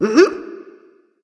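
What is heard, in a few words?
nonverbal agree; smite; ratatoskr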